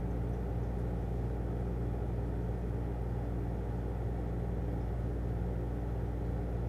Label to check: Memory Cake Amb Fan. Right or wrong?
Right